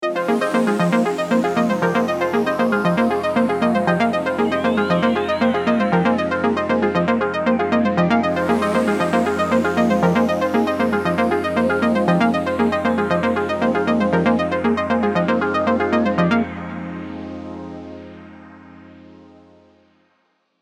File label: synths